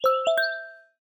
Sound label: email notification ringtone